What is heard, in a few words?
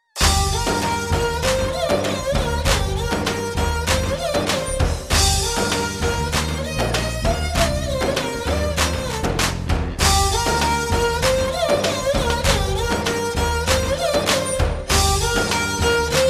Music Bit